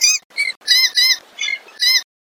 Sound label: monster seagull